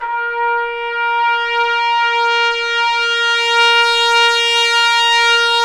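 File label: BRS TP SWE, Trumpets Swells; Roland L-CD702; Stabs-Swells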